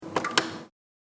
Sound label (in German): Geldautomat Auswahl